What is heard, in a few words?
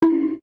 Bonk